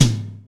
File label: TOM, Kit s; Real-Kit s